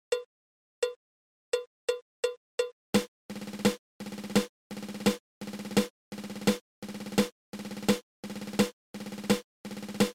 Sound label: Seven Stroke Roll Triple